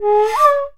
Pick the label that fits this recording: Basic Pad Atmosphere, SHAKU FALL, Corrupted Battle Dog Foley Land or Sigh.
SHAKU FALL